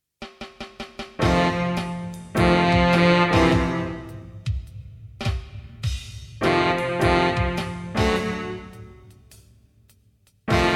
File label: big deal minus bass